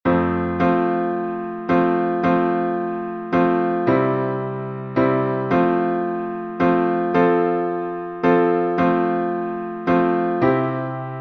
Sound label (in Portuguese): salmo instrumental